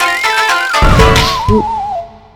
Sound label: failure; tf2ware ultimate; music game; wii katandana